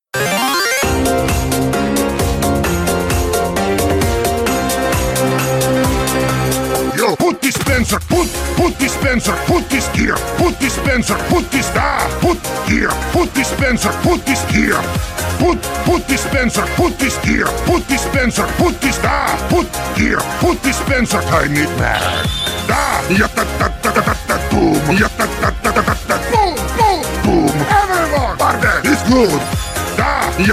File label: pootis song; tf; saysounds